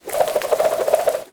foley rope swing fast lasso

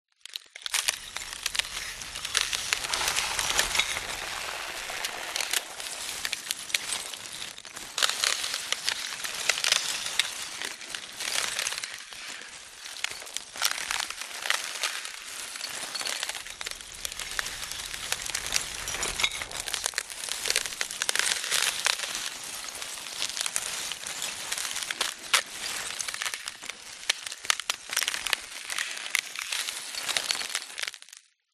earthquake earth crack debris rock split fall in; nature

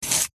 carrot chop knife single; food

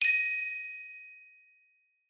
multimedia correct ping tone

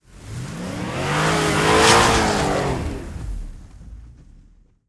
Tires Spin Snow; Blastwave FX

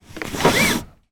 car seatbelt pull

